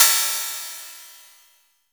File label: Cymbal